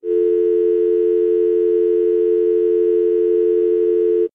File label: telephone no connection